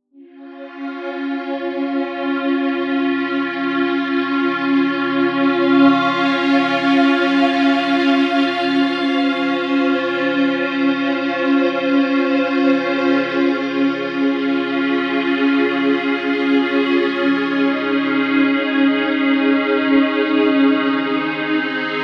Relaxing SPA Music

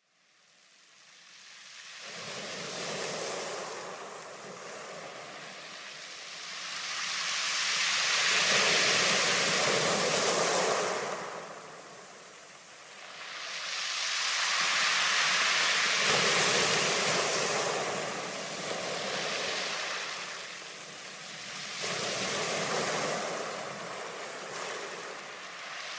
White Noise Upon the Sea

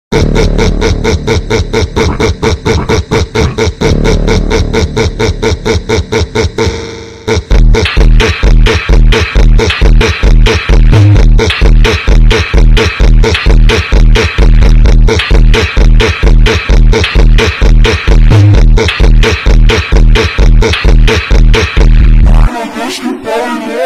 funk do horror

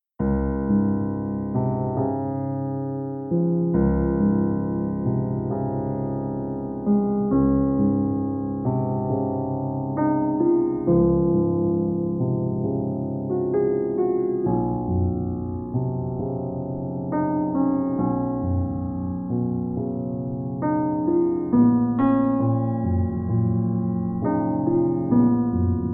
Relaxing Piano Music

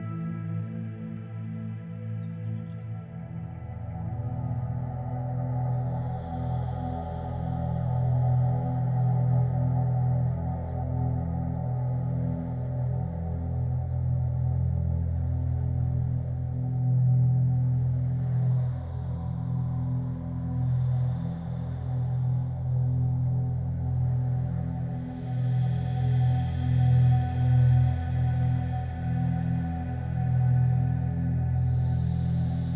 Relaxing Rain Sounds

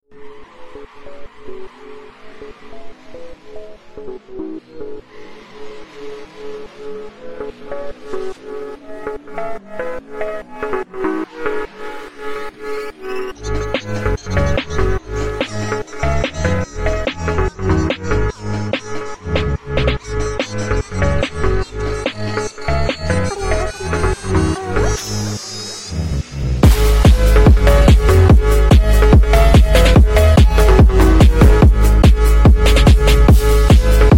Phonk speed up

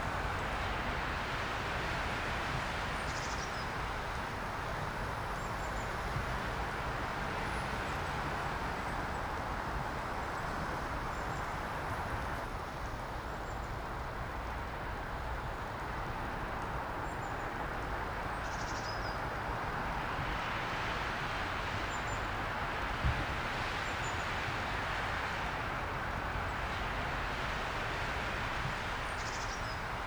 Rain and Road Noise - Tires on Wet Tarmac; ASMR

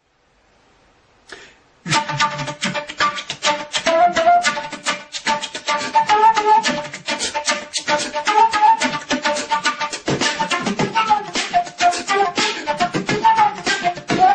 Beatboxing Flute

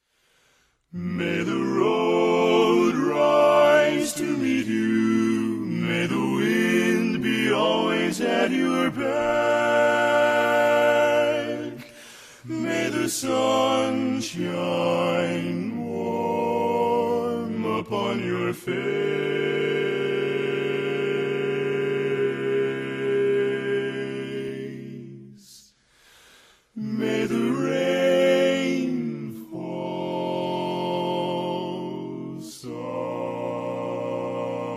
Barbershop quartet

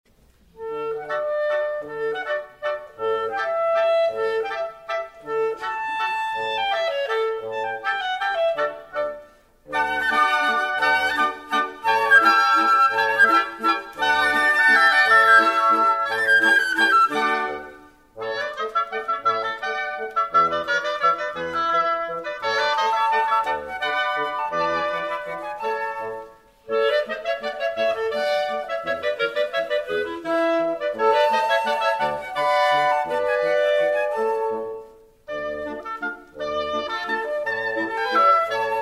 Waltz 2 In D Dur

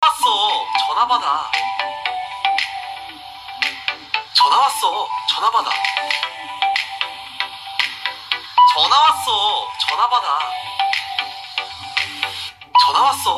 ALARM; LG

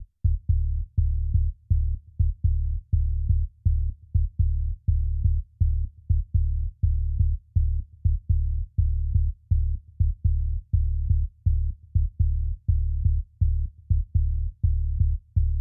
Bass American Tools

